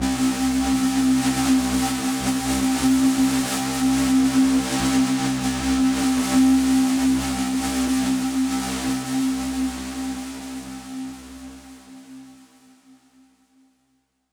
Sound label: Overlay Dark